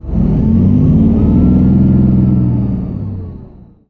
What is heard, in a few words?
leo2a5 engine int load, tanks; engines; War Thunder